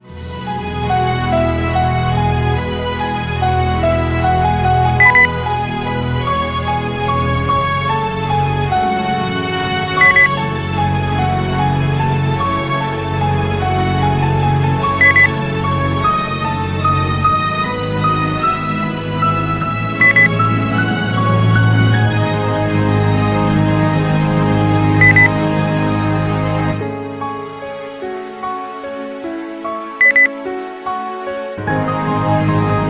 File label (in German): klassik